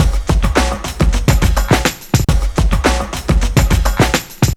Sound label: hard knocks, 105bpm; Zero-G; Freak Beats